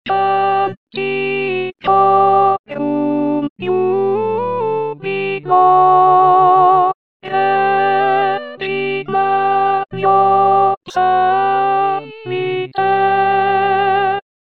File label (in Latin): canticorum iubilo contraltos; haendel